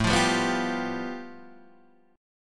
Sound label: A 7th Suspended 2nd Sharp 5th, Guitar, Open D; 7,8,5,5,8,5